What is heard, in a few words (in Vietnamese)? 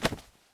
Tieng ray ru quan ao